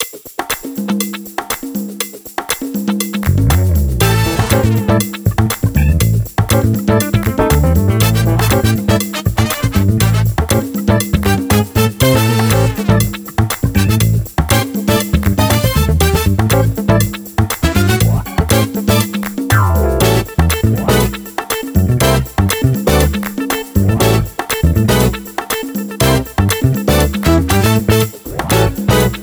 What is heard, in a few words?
Fast and Funky